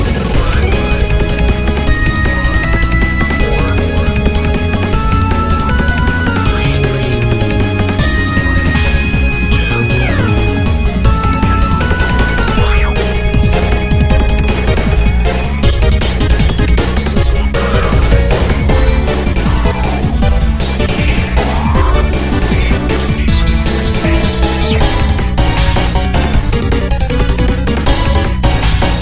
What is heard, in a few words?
Battle; Colress